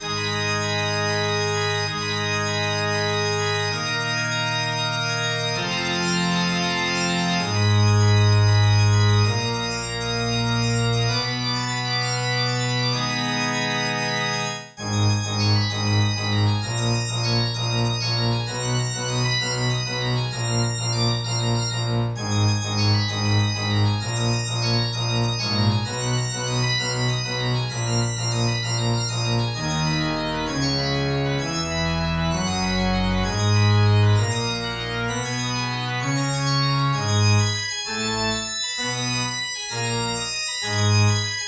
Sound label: Organ Solo; Final Boss Battle; Cadence of Hyrule; Ganon Final Boss Battle